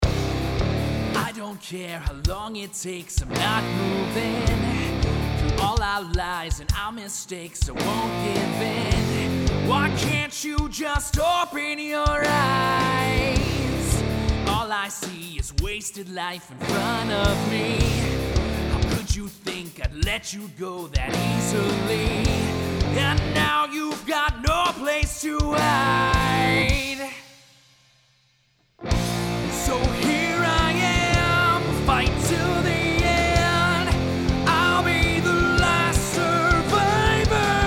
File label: Track Townsend C12, virtual mic